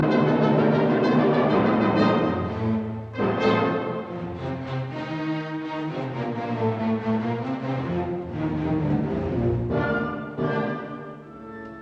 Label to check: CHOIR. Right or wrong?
Wrong